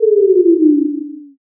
debuff

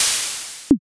airlock

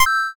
saved

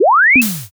warp jump

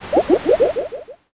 poison

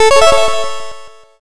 win

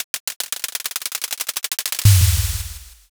drumroll

